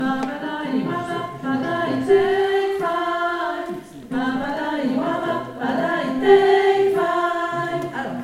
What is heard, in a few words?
Pabada sopranos